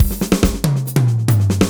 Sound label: Electro Fills